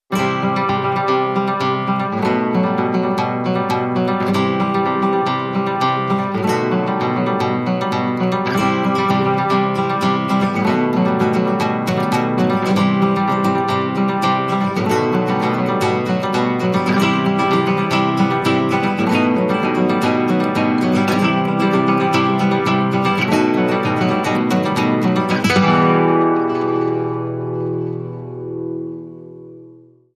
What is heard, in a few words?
Spanish Nights, Acoustic Guitar Only